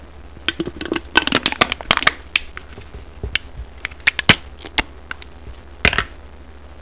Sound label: CRUSH CAN